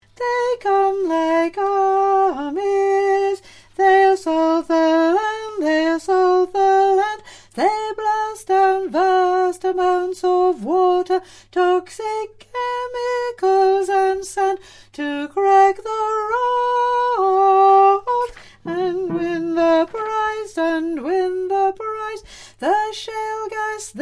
Tenor

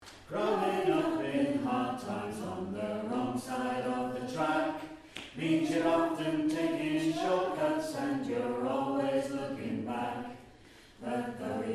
Alto Tune

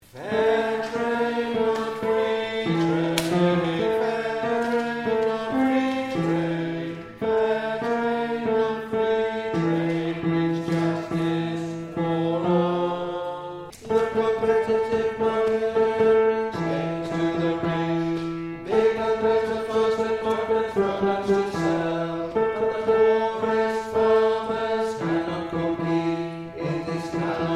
Tenor

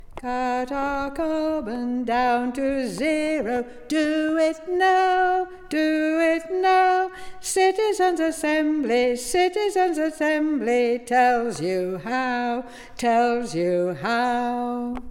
Cut Our Carbon Down to Zero

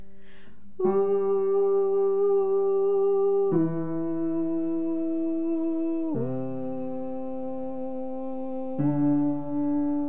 verse bass oooh x4

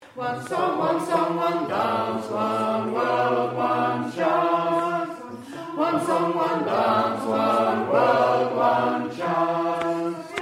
bass

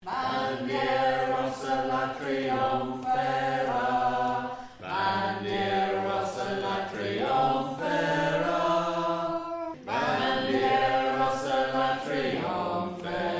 Bass

